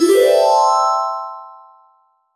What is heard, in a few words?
power up sound harp sound